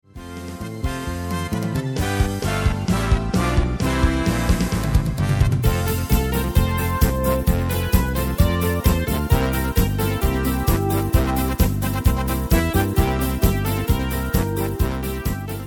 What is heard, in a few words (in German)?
70er Kult Schlager